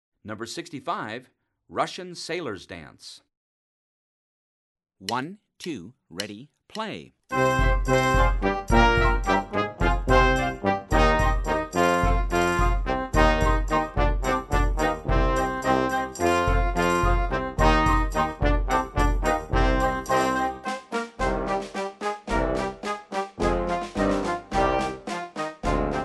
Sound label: russian sailors dance, band